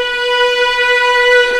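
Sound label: viola B4, ARCO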